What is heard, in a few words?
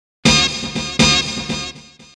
message alert tone; htc